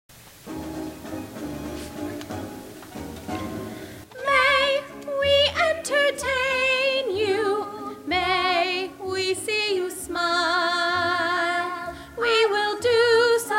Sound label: May We Entertain You Vocals